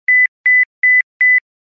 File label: Low Beeps; LG LG9600 Versa